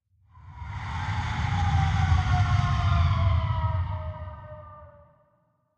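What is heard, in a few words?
artillery projectile, tanks; War Thunder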